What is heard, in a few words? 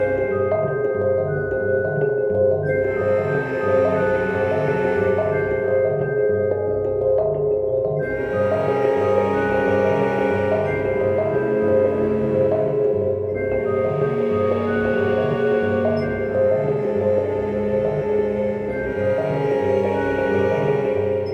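music ocean abyss suspense; Rayman Origins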